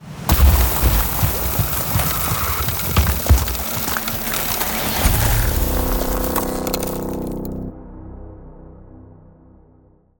ice spell ultimate; Ultimate Ice Spells; Icy Cataclysm; Arctic Aura

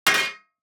DICE on Metal, Throw and Roll, Metal, 1 One Die; Samples; ArticulatedSounds; DICE Sfx Party (Extended)

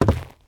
zombie footstep wood; DeadFright

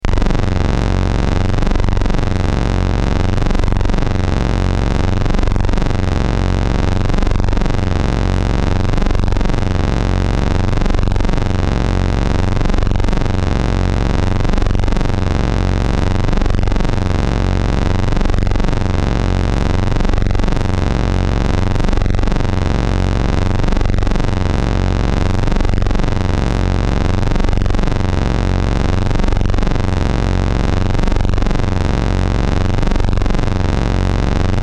Low Intense, Drones; RoosterFX

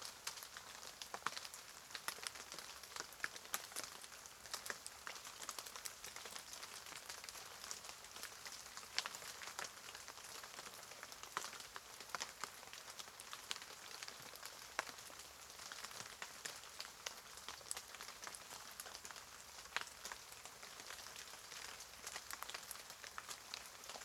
Rain sparse aftermath, Thunder And Rain; boom library

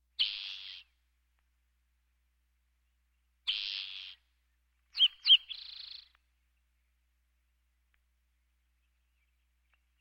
Birds

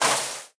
bite; Apotheorasis

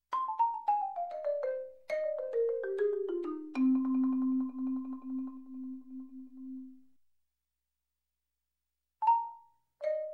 Marimba bridge, Music, Percussion; Sound Ideas 2000 Series

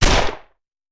Machine Gun; Sound Ideas; Guns

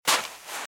Footsteps - Sand - Dry - Walk - Left; RadwinIsland

